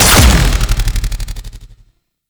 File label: Short Bow Charged Fire; Warframe; Duviri; Dax; Weapons